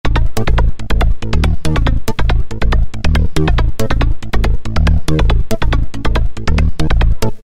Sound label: arp Synth Bass, E-mu PK-6